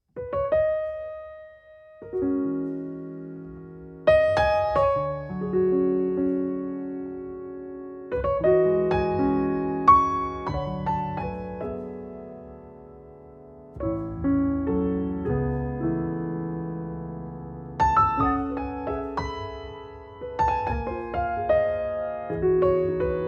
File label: Piano Interlude